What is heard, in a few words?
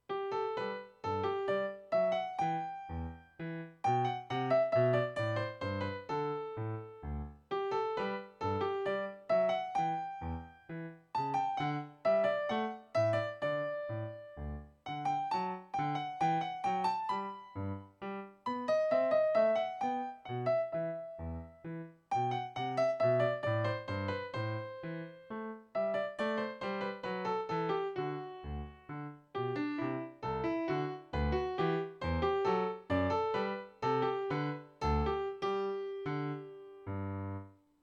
Rigaudon; Hendel